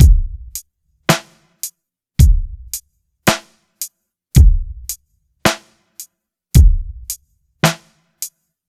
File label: Ballad Beat, Drums